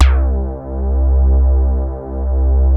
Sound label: CAT HARD C3, DIGITAL BASS; Music Store - Claudius Diemeter Schwerlos